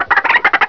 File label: cluck